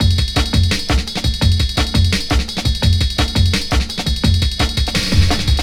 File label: Drum Break, Drum & Bass; Fluid Dynamics